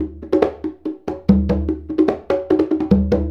Congas; Essential Percussion

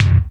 Kawai r-50; Drums

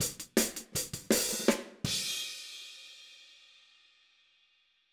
Outro Hi Hat, 162 BPM, Drums; Drums from the Big Room The Mixes; Take Your Toms Off

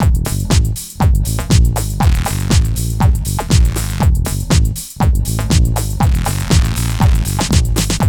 GHET ROL

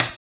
HAMBONE, Vocal Blues Soul; Spectrasonics; Planet

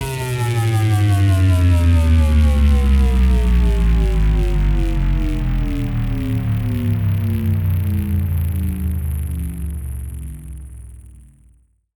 Dropping Reese Tone; neuro; BIG5AUDIO

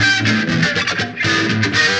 Guit Scratch Funk; Sonic Foundry (Sony Creative Software); Ugly Remnants VOL-2

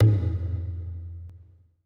E LOG, ETH DRUMS

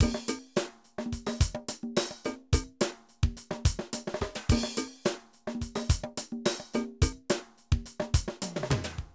Drum loops, 70s funk; Zero-G Groove Construction (1993)

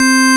KEY XFM, Organs; Club-50 - Foundations Roland

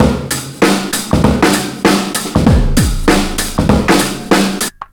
97.2 bpm, Breaks; BONITA; POTBreaks

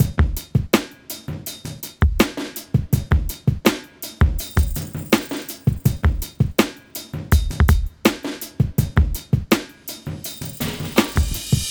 Drums, Hip Hop

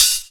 Hat, E-MU Proteus-2000

